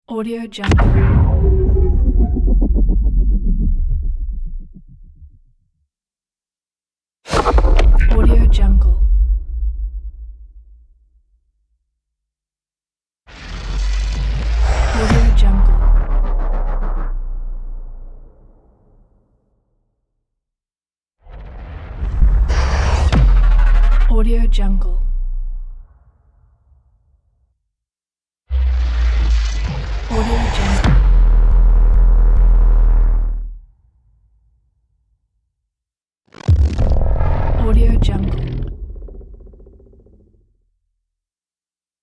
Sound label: cinematic impact